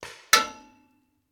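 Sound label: Paintball Gun Impact; Sound Ideas; Guns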